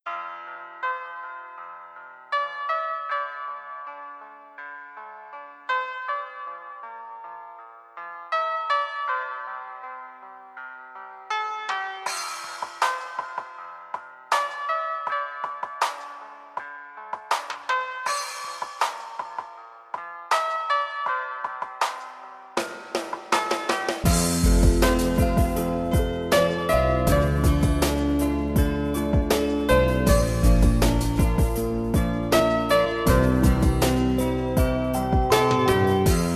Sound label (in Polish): muzyka do masażu 432 Hz; zapach lotosu